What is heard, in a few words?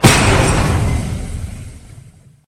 hit